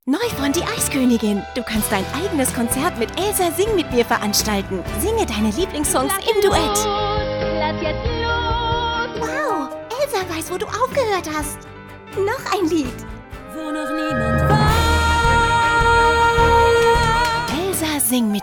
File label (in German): Sing mit mir Stimmprobe; Disneys Elsa